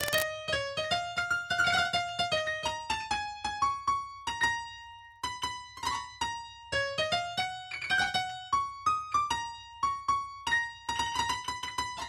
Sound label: Drop Synth WET; Drop Synth 6 WET SC